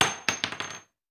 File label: Bullet Shell; Sound Ideas; Guns